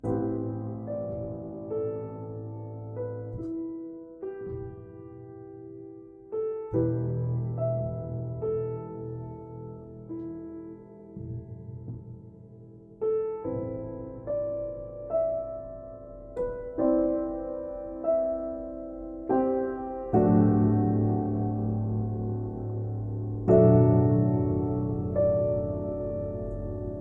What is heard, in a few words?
short piano; vignette; craft